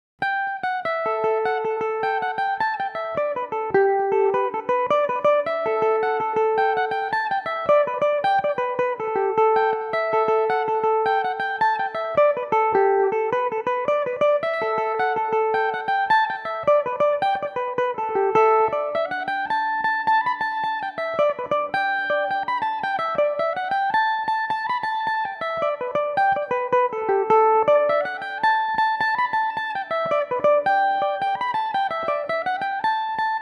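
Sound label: A dorian, irish mandolin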